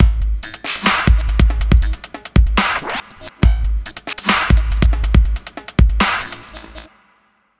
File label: drum loop, Dubstep; Dubstep Sample Pack